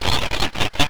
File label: floater attack; hl1 npc